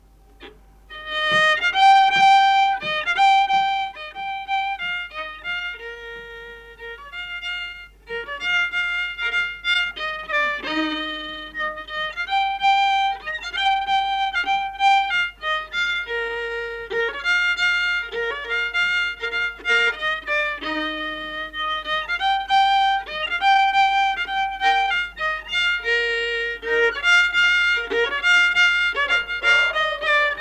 lugu viiul folk; Ruhnu

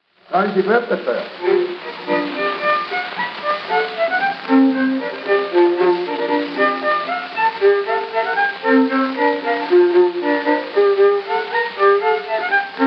pulma polka viiul folk; Haademeeste; Kellamehe; Heinrich Grunberg

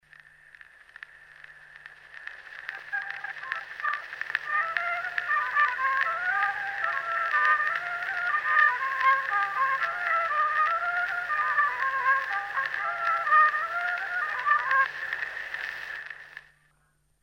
Vana labajalavalts, viiul folk; Kuusalu khk; Eduard Aaman

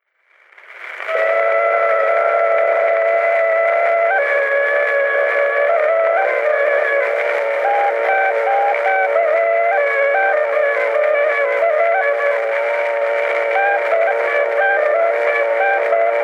Torupilli Jussi lugu, Emmaste, torupill, folk; Juhan Maaker

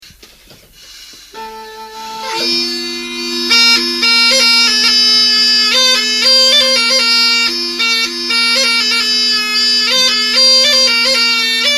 Tantsulugu Karksi torupill folk; Peeter Sild

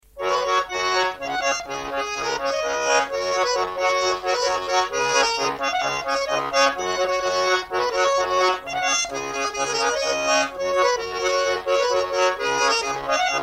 Polka, loots folk; Polva; August Teppo noorem

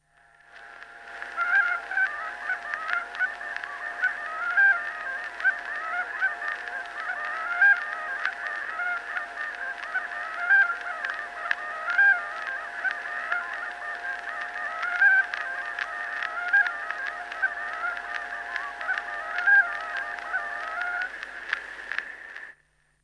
Vana rannatants, torupill, folk; Kuusalu; Jakob Ratsov

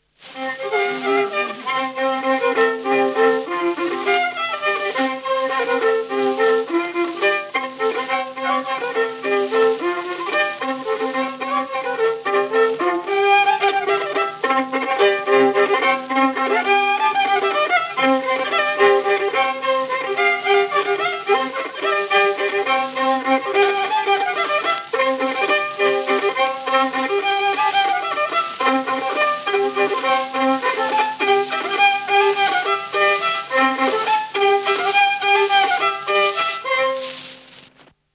Aadu valts, Paistu, viiul, folk

